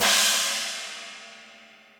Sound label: China, Cymbal; Drums; Drum Hits; Standard Hits; Ambient Loud Punch; Session Drums Studio